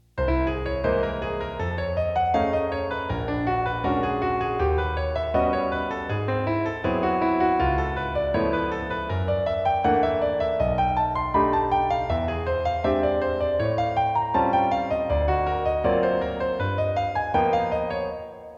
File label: Altered Scale Study; Jazz Piano